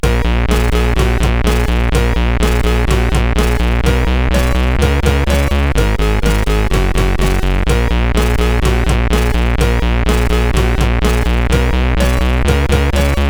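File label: Xmas Rock, MOS8580R5 sid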